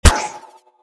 prop spawn; dlfortwars

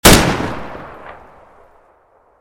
fal fire, rifles; weapons; swb

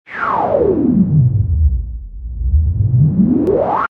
slomo; jailbreak

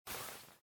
parachute disengage; weapons; grubs

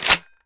spas12 pump forward, shotguns; weapons; swb

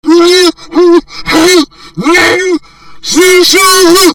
scream, zombie screams

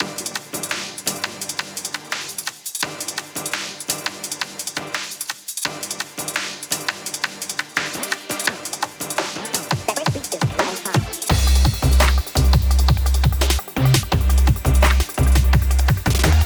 stream loops; tallbeard; terrytarget

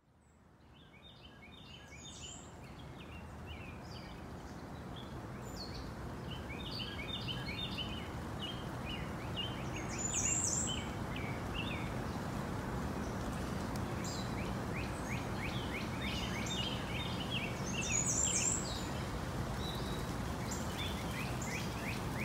ambience; hamsterescape

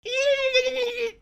scream, zombie screams

